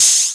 Cymbals HH Open; Vengeance Samples

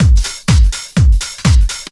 drum loop 125, puristic, vocal house; PS-28 Vocal House Samples; drumloops

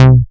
Bass C, Offbeat Bass

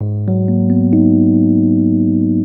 rho g#, rhodes; house; PS-28 Vocal House Samples

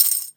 Percussion; Vengeance Samples

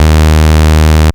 Root D#, Bass; Vengeance Samples; Vengeance Ultimate Bass

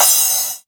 Crash, Cymbals; Vengeance Samples; VEH2

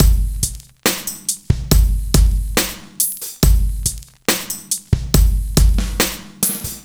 Slomo Beat 140 BPM, Loops, Slow Motion Beats

